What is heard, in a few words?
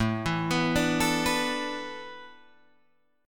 A Suspended 2nd Suspended 4th down Guitar Drop D